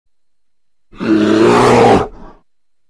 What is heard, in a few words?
bear